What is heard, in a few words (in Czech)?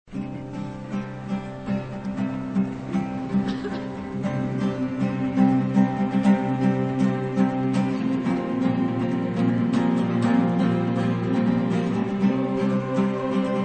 aleluja